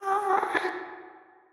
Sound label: moan, ghast